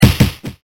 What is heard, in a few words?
punch